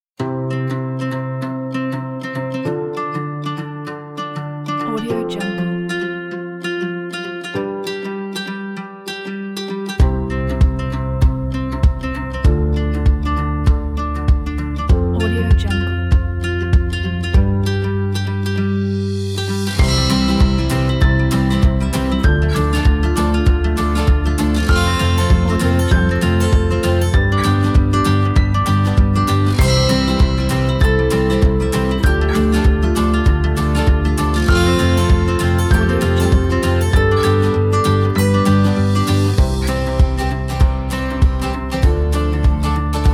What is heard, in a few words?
peaceful folk